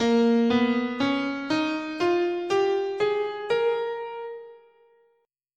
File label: Asharp Phrygian sharp6